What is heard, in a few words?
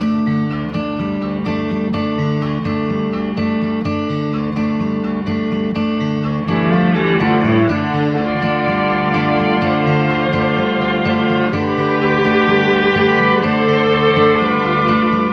guitar and strings, Sad